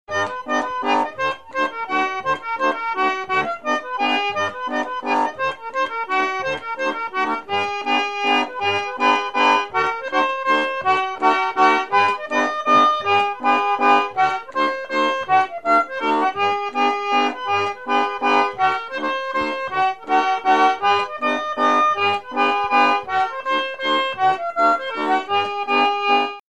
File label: mazurka; folklivsarkivet